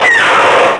fire, weapons

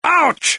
pain

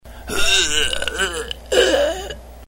pain

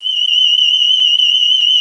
tricorder scan

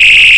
phaser firing; phaser2

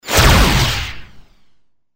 phaser; colony7

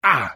pain

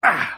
pain; spock

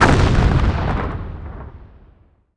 explo large

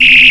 hand phaser firing; weapons, phaser

